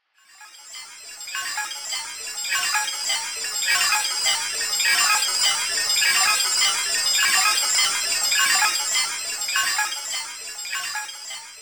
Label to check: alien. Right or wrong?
Right